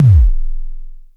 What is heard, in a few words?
KICK LO, Dual Percussion Synthesiser; Klone